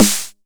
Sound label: 909 SD; Goldbaby Tape808 & Tape909; The Tape 909